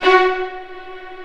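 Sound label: Vio spiccato F#5; Digital Sound Factory Virtuoso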